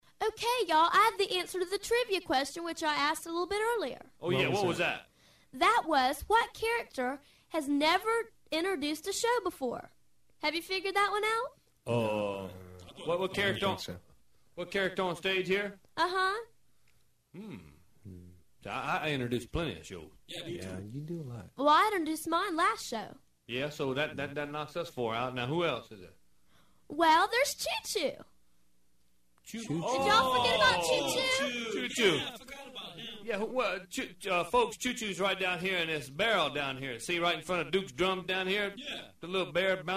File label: choochoo